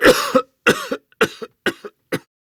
Coughing; PTSD; Warframe; Evacuation

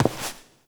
Step; Warframe; Koumei

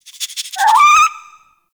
Rabbit Ambient Call; Warframe; Duviri

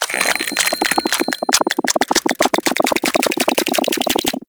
Grenade Countdown, Infested; Warframe; Zealoid Prelate

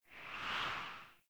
Pre Landing Wind Gust, Falling Wind; Player

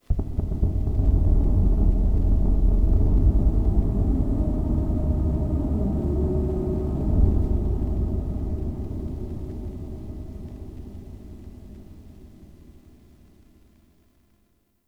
Dark Texture; Ambience; Warframe; Shadowgrapher

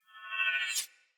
Long Sword Whoosh Accent Heavy; Weapons; Player; Swords; Pothia

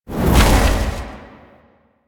Void Dragon Orb Return; Duviri; Abilities

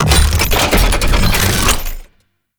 Mech Siege Mode Stop; Warframe; Nechro Mech; Abilities

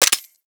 Hand Shotgun Mech, Weapons; Hand Shotgun Prime

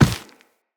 Operator Run Dirt, Footsteps; Characters

